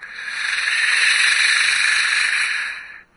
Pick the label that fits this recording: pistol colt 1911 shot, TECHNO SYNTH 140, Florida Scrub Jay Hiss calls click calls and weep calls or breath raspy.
breath raspy